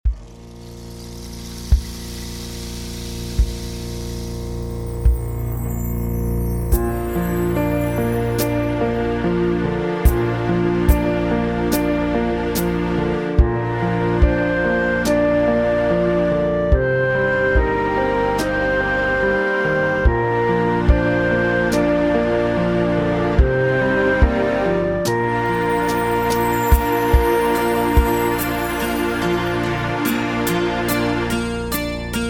Suo Gan B Flat

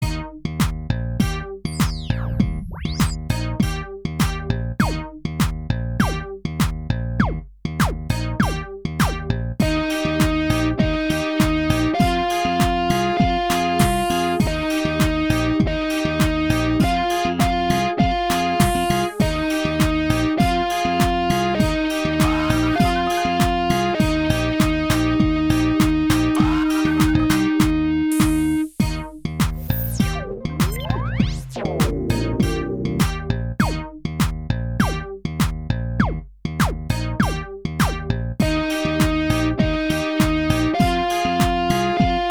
Rocking Robot Practice Tempo E Flat

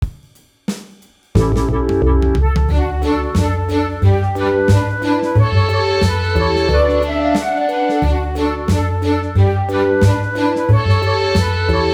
violin and viola performance tempo

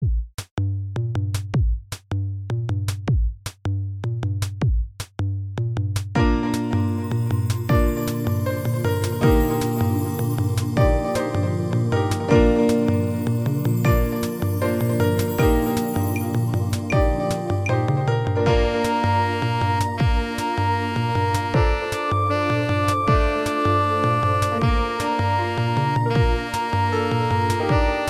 Two Note Chill B Flat

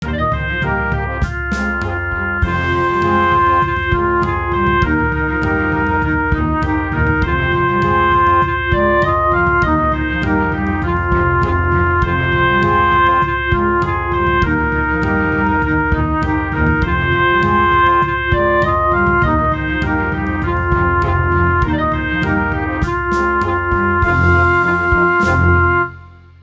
Clarinet in B flat; Drunken Sailor

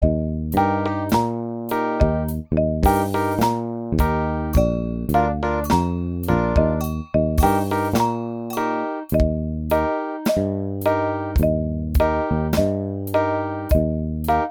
Elevator Song Practice Tempo E Flat

